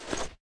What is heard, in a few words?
crouch in